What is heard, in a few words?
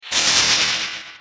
appear